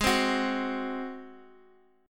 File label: Ab 7th Ab down Guitar Open D